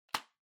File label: Calculator Noise